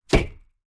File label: wood iron break